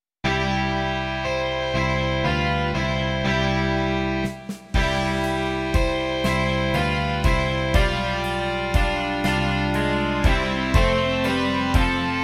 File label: rock